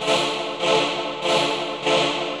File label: KEYB; MAGIX